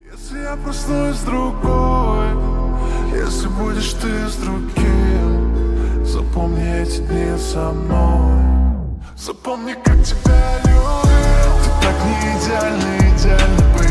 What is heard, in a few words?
Slowed + Reverb